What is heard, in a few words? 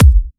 Bassdrums; Vengeance Samples